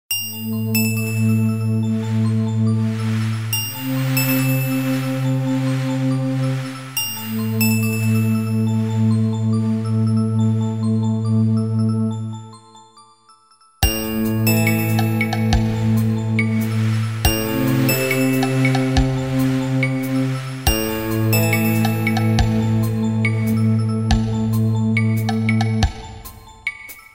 Nokia Bloom

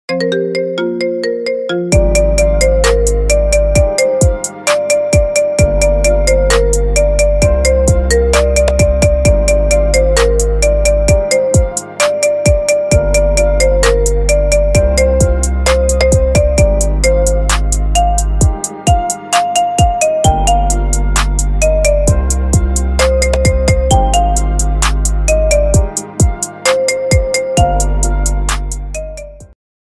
version cumbia, dzwonek; Iphone 11

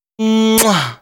Kiss Kiss

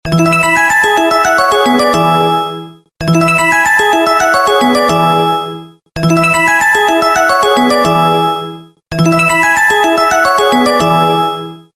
Nokia X7 Fantasy

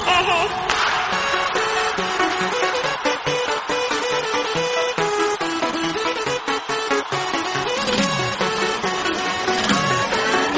iPhone X Dididi

